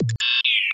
accept; Allegiance

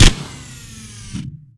minigun; Allegiance

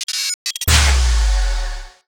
power up development; Allegiance

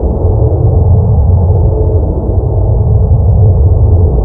aleph loop; Allegiance

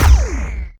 plasma rifle; Allegiance

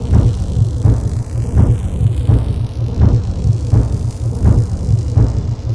mining sound; Allegiance

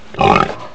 oink; Allegiance

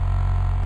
asteroid; Allegiance

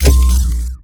no energy; allegiance

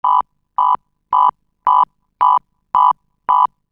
new target neutral; allegiance

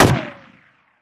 machinegun; Allegiance

